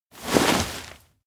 stagger; rikka; anemoi

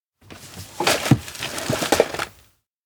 trashbag dispose; anemoi

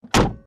car door; anemoi

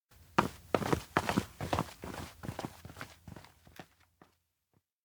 steps indoor school walk; rikka; anemoi パッケージ版 1.1

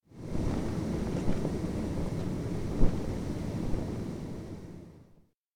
strong wind; anemoi

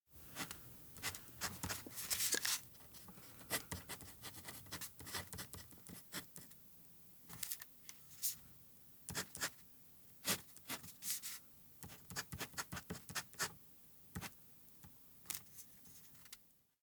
write request worried; spica; anemoi

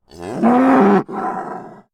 cow voice; anemoi

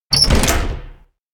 door open fast; meguriai; anemoi

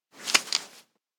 hook pen rope; anemoi パッケージ版

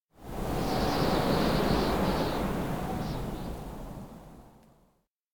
strong wind; anemoi

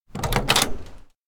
door open; meguriai; anemoi

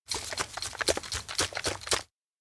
shaker shake front; anemoi

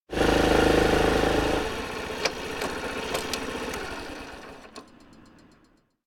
bike slow stop; anemoi

